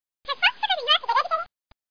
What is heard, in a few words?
chatter